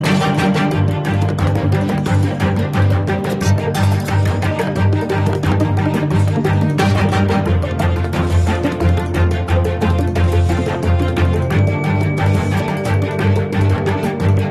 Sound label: loop Action